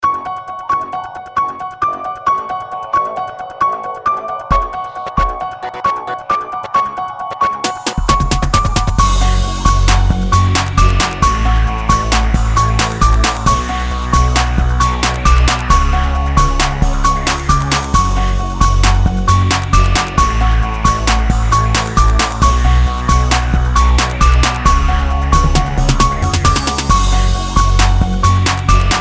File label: Halloween Remix